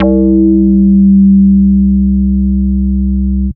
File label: Single Electric Basses, Dance Floor Bass